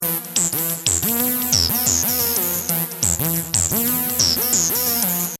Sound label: Hip Hop Synth Licks 90 Bpm E Straight; Nuff Spit; Royalty Free Synth Loops